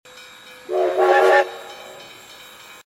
Train vapeur Cloche Sirène

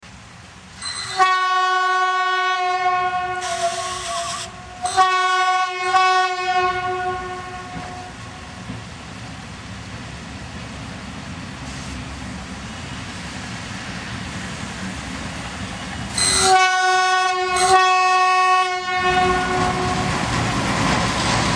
draisine, trains sons

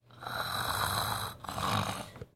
ronfle

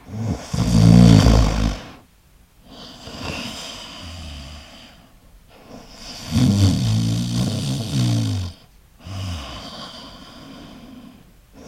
ronflement

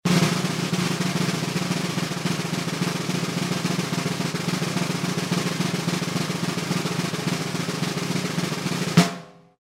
tambour

